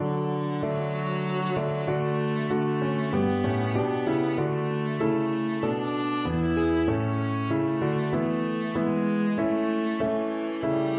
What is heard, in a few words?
viola